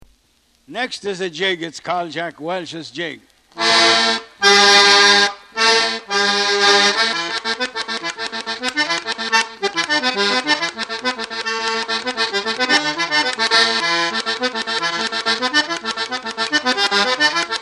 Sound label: Jig; Jack Welshs